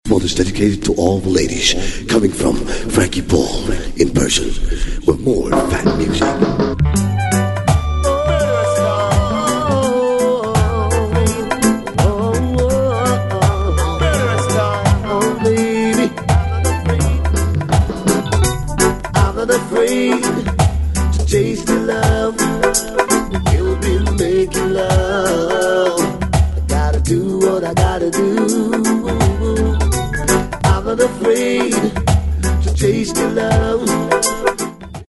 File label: SAX